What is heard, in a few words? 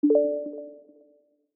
dice win